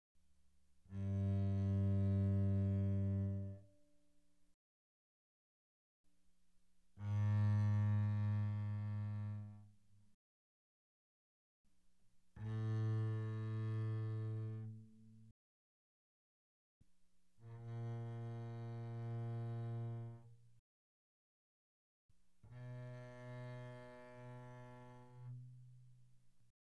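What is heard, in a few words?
Bass arco pianissimo sul G G2 B2, doublebass; Strings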